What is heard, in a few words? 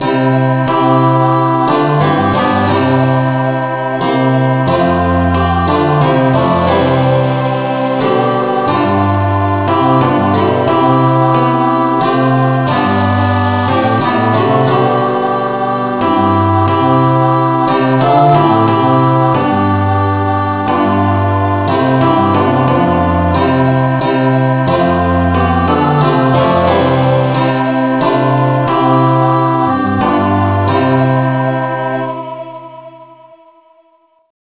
no vocal key C